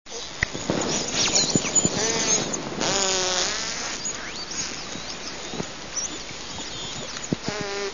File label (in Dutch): gestreeptedolfijn; walvisstrandingen